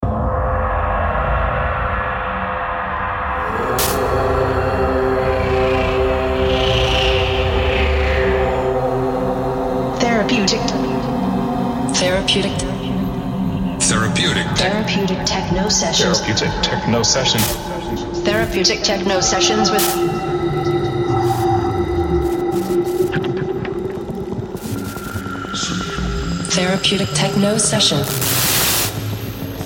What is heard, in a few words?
techno; therapeutic techno sessions